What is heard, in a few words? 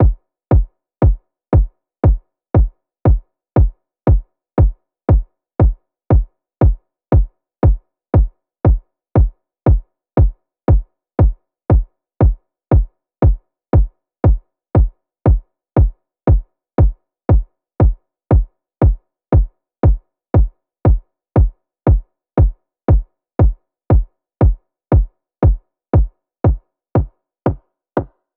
Drum Loop - 118 BPM Kick; Loop Stems; Cymatics; Pacha Drum Loop